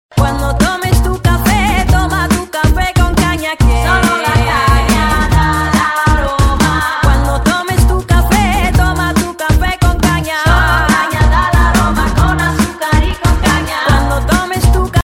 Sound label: noha ringtone